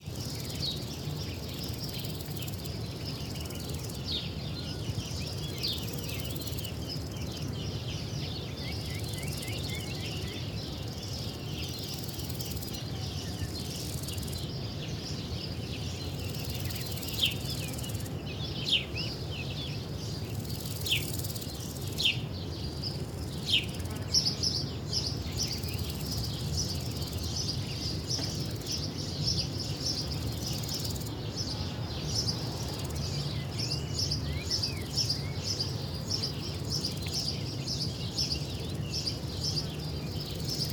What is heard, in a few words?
Cicadas, Crickets, Insects, Birds, Nature, Spain; AMBBird; Jake Fielding; Sounds of Spain